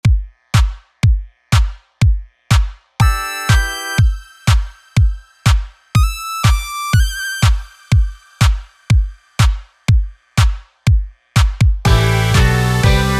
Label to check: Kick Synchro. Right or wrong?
Right